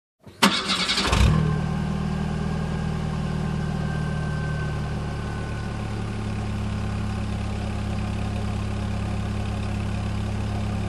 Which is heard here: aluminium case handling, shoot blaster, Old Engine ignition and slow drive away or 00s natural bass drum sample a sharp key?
Old Engine ignition and slow drive away